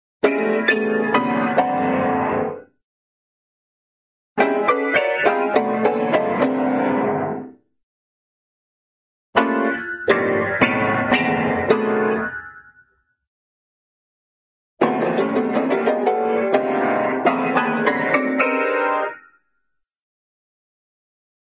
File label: piano, cartoon wild piano take, music